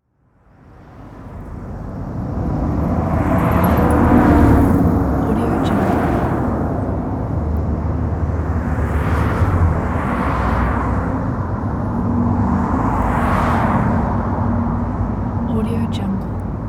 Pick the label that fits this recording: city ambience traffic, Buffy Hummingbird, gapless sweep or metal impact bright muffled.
city ambience traffic